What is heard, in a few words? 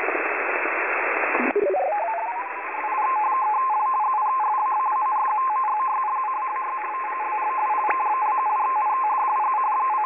Data Transmission Sound From 14664 8 Khz